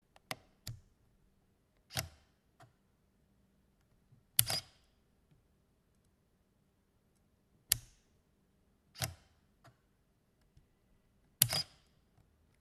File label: Turning on and off